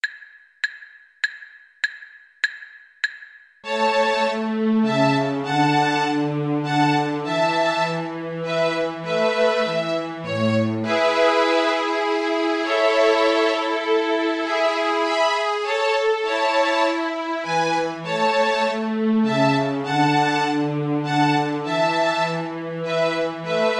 minuet, Viola

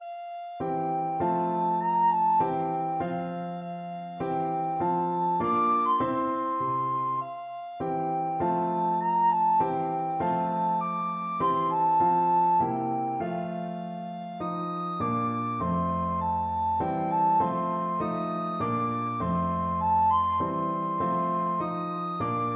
baloo lammy recorder

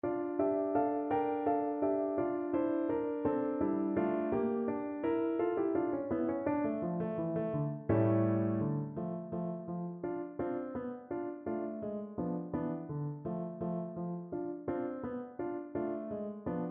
libes shmertsn FL kar, Flute

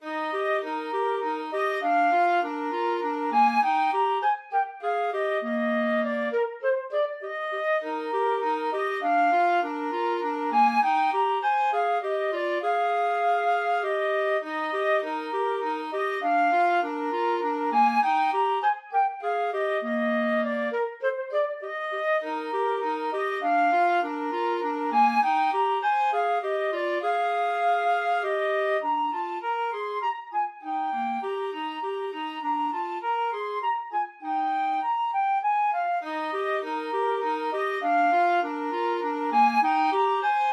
flute clarinet duet

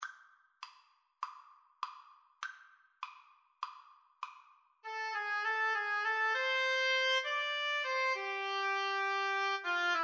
24th st rag, Oboe Cello Duet; Band Playalong

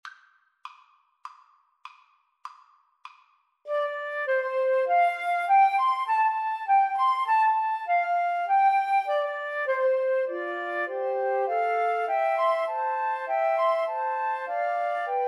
trio, 2 Flutes Clarinet

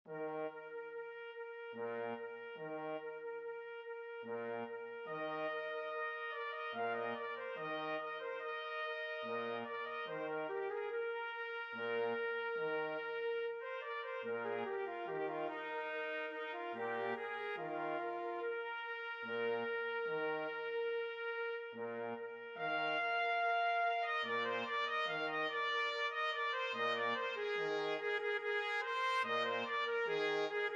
2 trumpets trombone

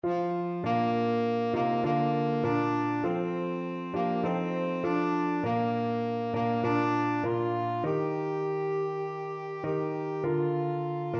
tenor saxophone

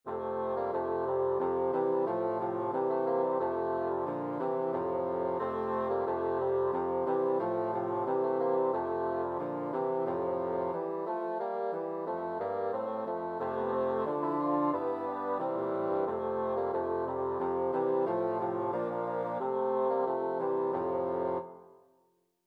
bassoon quartet; deck the halls

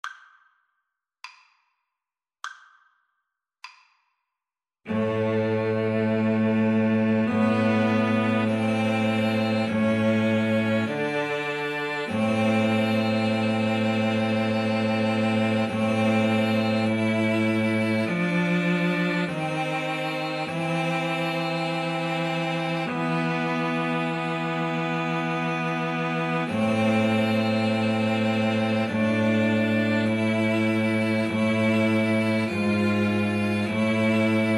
Cello Trio; bruckner aequale1

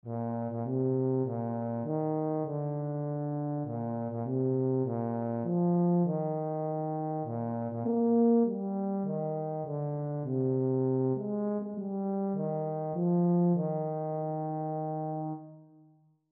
baritone horn

